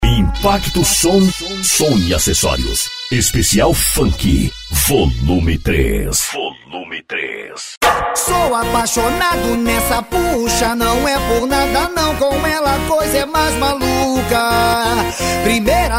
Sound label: funk bass; impacto som